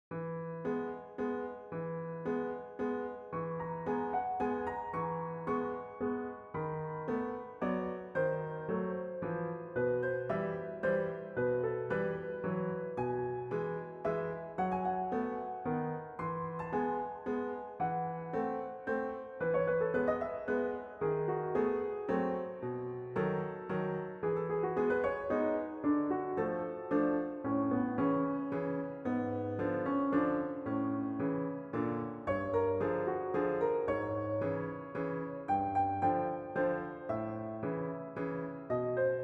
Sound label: Catnip Waltz, keyboard